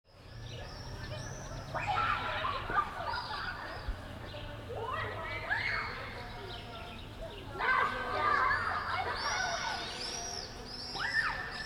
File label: Suburban playground ambience distant perspective